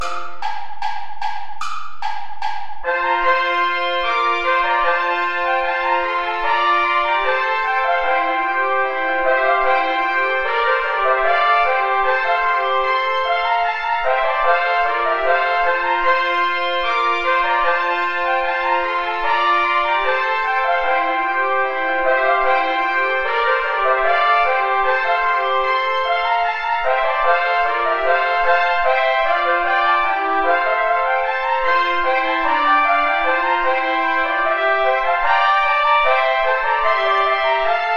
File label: ballet play along without trumpet; praetorius michael des baccanales